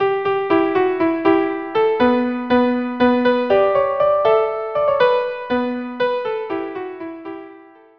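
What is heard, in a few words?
violin viola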